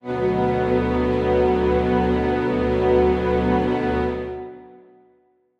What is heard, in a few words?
Strings start